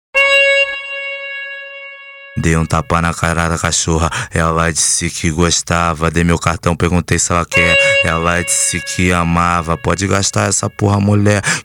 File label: funk; explode funk vol5